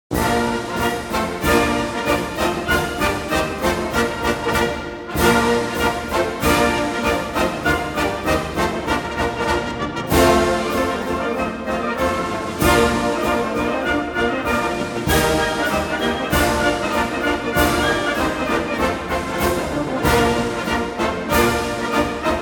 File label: march, military district headquarters band; preobrajensky; leningrad